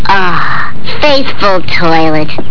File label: toilet; Bart Sounds; Simpsons